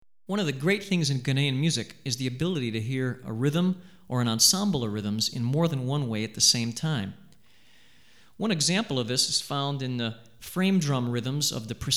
Adowa, Asaadua Examples, West African Rhythms For Drumset; Hartigan